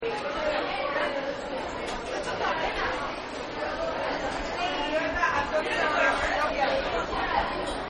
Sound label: Children Playing; Playgrounds; Backgrounds; Partners In Rhyme